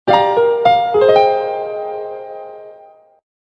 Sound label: heart jingle